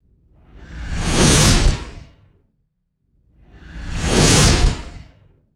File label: fast ethereal swoosh pass bys